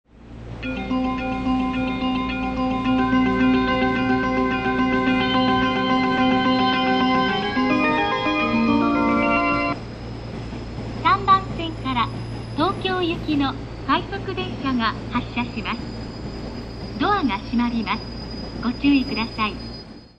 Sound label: melody, station